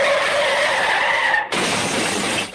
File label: car crash sfx